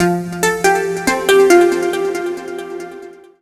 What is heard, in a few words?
TECHNO SYNTH 140; Keys